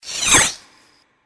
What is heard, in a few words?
Rattling Whizz